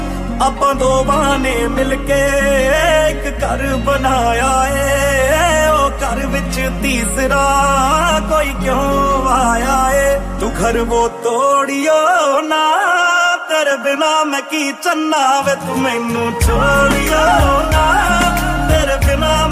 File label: Punjabi Song Ringtone